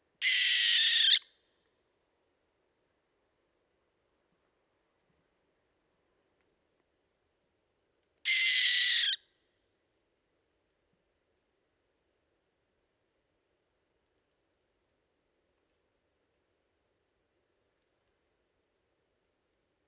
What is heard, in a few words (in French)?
Effraie des clochers Tyto alba; carnet nature ete